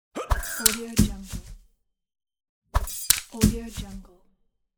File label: head cut off; applehillstudios